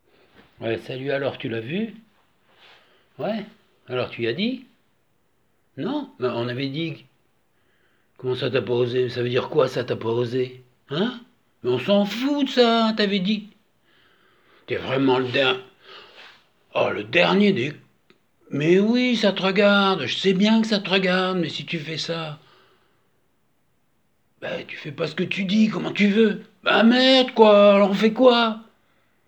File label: Vocal